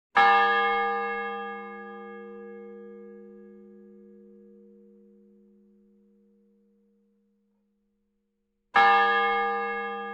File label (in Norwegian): ta keklokka pa odderoya fyr